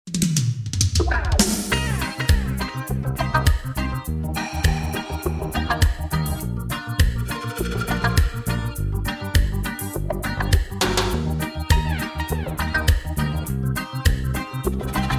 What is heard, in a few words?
Song of Ocarina (Ocarina Solo)